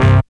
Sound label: SYN DANCE; Sound & Vision - Gigapack II